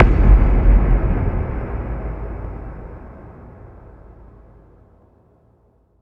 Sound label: FX Kicks